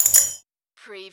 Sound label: Button Sound - Metal Nut Quick Jar